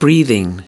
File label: breathing